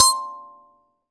kalimba c, Thumb Piano; ambient; Chillout

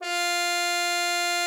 CUP MUTE, F4; Xsample VOL-3

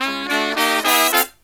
Brass Riffs

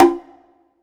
SR D CONGA, Drum Mashines, SR-16 DRUMS; EdgeSounds

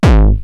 Roland TR-909 BD; BD Selection

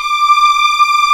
D6 LEG MFI L, The Ultimate String Collection; East West

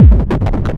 HD BD, Hard & Loud Techno; USB Soundscan vol.01; AKAI

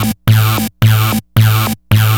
Techno Synth

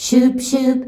"SCHUBSC" C, FEMALES

